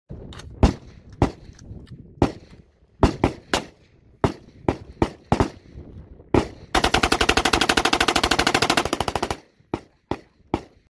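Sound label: M4 carbine, gun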